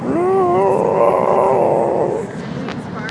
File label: vroom me roar me